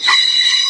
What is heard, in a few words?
subway sound; Subway Sound Construction Kit